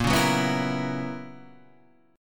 B Major 7th Suspended 2nd Suspended 4th A sharp, chord shape